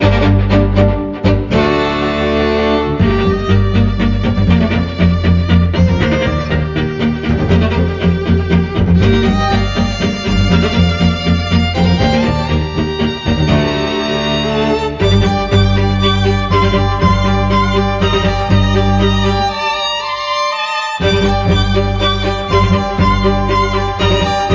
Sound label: BGM battle